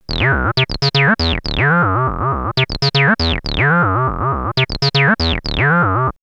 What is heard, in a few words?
303 Loops; X-Static Goldmine